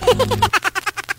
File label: laugh chipmunk; ooh ahh